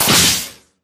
imp bullet lightballistic shieldwall sizzle; Titanfall 2

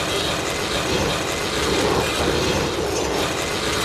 zipline loop; Titanfall 2

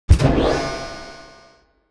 Assembly Conveyor Start Close; Boomtown; Titanfall 2

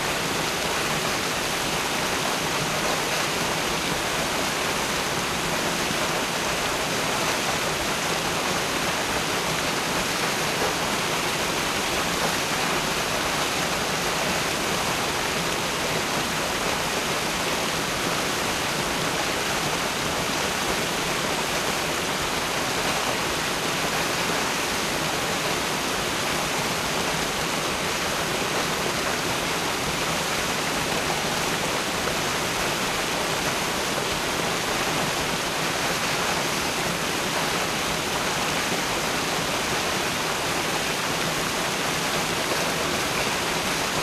Small Water Fall Bottom Close; Homestead; Titanfall 2

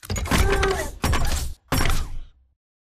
alert idle to walk; Titanfall 2

